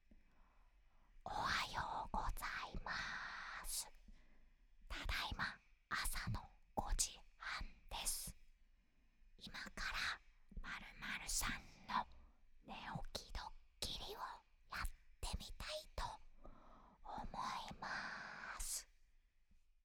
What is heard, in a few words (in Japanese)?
uragoe